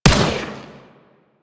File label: Arch Burst Gun Shot, Weapons; DE; Warframe; Archwing